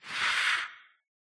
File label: idle, phantom; mob; Minecraft